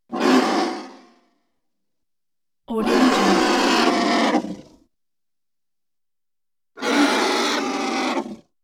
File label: monster vocals shrieking roaring; prosoundfx